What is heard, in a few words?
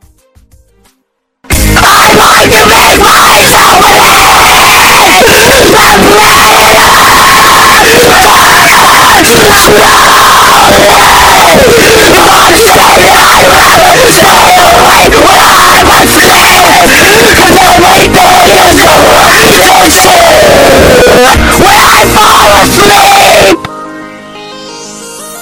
but im screaming the lyrics